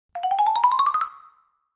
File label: Xylophone Come Upsta